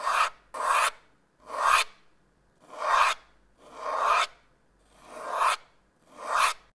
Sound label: Swoosh Plastik